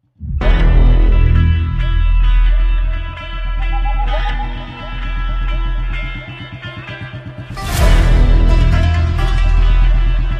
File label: ugram movie bgm ringtone; allari naresh; ugram teaser